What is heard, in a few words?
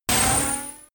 sci-fi laser shot